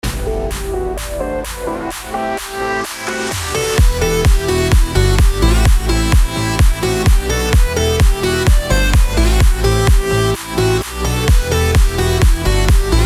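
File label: progressive house, E minor, 128 bpm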